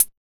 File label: TIC, Big Beat; USB Soundscan vol.25; Big Beat Drumloops; AKAI